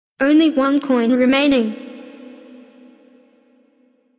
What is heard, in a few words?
coin; unicorn